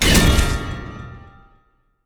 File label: alteration ebonyflesh fire; ironflesh